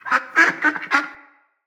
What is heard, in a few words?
Freed Duck Call; Encounters; Animal Rescue